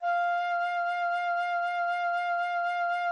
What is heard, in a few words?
flute F5